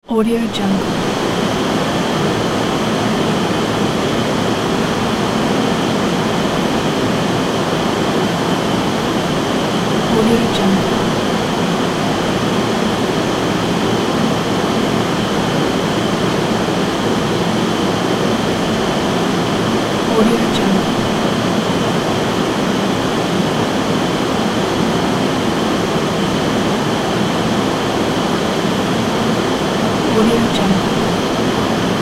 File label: passenger cruise ship engine room roar; sound ideas